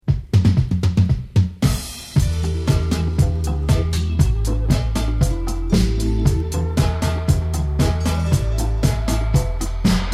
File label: Harp Dub